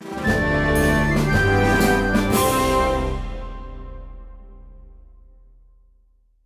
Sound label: Fanfare Lose